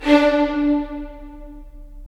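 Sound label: strings violins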